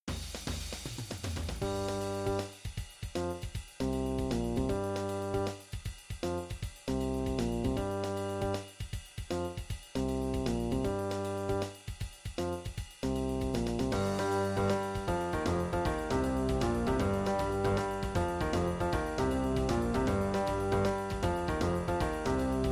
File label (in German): Lied ohne Namen G Moll